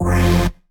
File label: Synth Shot; Vengeance Samples